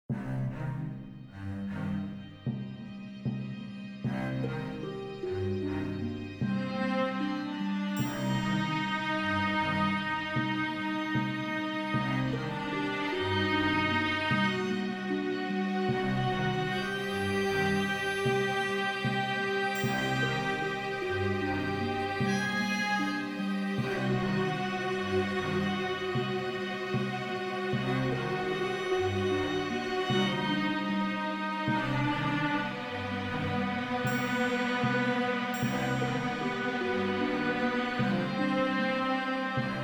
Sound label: Suspense; Sherlock